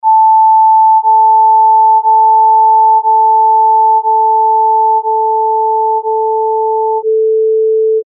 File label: pitch descending; eartraining